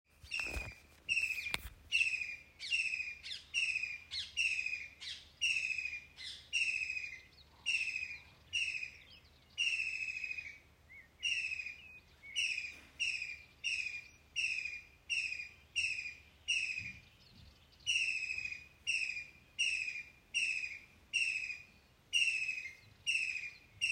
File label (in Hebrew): ציפורים